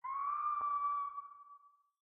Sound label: Scream Outside Ship; Lethal Company